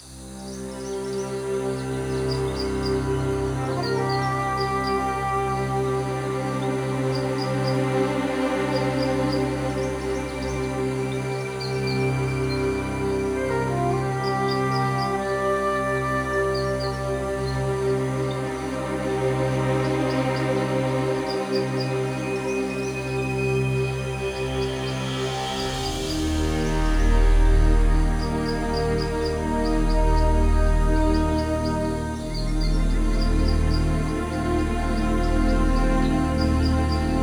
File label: Level Outside; Dungeon Hunter; android game music